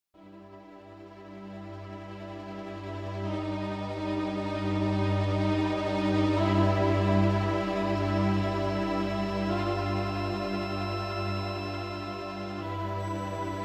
Negative; The Witch and the Warrior; RPG Maker

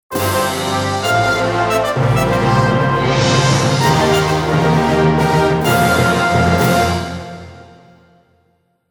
Victory Fanfare; Crystal Defenders

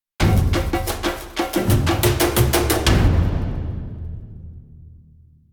Combat Out; Novigrad

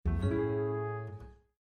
Cityscape Balloon, windows 7

